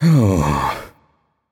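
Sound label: nonverbal sigh; voicepack; smite; merlin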